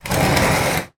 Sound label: foley glass jar scrape on metal; zapsplat